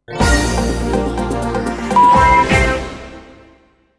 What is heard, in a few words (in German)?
Sound Logo mit Sound Bett 2 Toene funkig; Soundlogos für Ihr Corporate Design